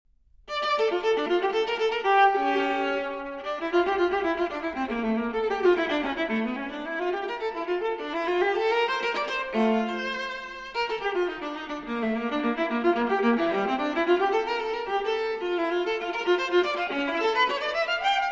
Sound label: Cello Suite No. 2 in D Minor Transcr. For Viola Courante; J.S. Bach; BWV 1008